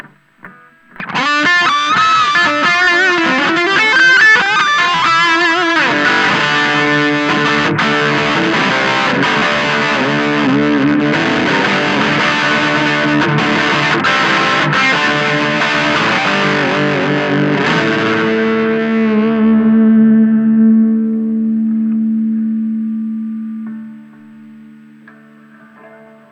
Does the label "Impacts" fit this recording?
No